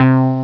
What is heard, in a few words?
Synth Bass 1 (C2); 3D Ultra Pinball Demo; Pinball Demo Music